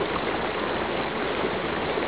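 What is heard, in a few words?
waterfall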